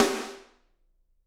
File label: SD, Drums; Bob Clearmountain Drums I